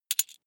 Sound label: coin big drop heavy